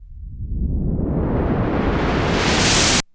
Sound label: cinematic impact wipe